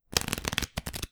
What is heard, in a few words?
card dealing; hearts